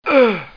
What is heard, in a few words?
UGH; How Many Bugs in a Box